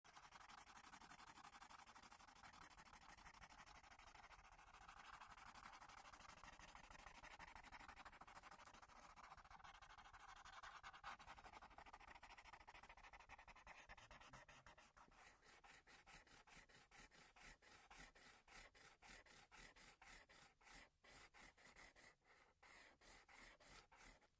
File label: pen colouring